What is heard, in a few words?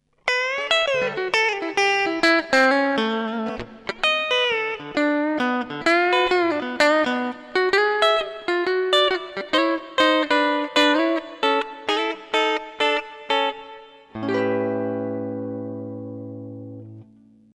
telecaster